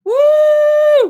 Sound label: cheer